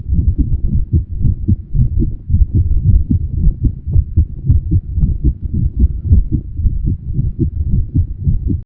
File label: heartbeat